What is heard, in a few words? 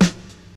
dope steel snare drum sample F sharp key